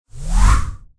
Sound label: Swoosh Transition